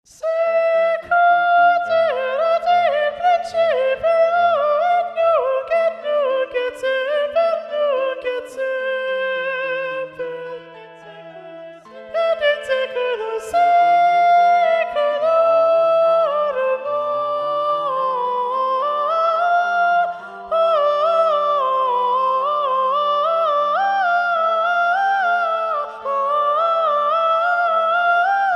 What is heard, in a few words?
soprano 2 predominant; sicut erat magnificat ssa; niccola porpora; ed. hunter